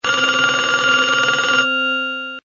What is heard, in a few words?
Old Phone